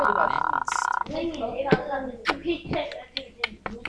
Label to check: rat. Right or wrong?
Right